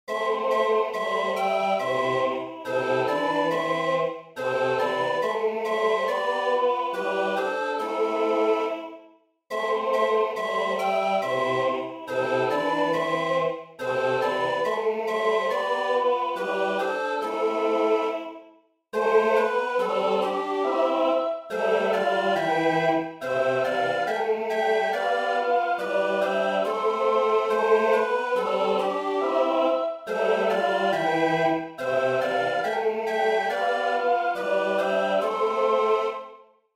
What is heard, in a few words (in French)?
chorale; ojosazules